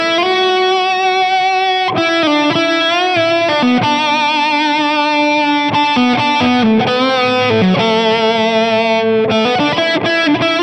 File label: ROYER 101; studio